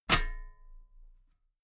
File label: metal ladder step heavy